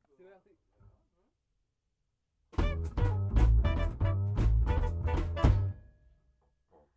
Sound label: riff break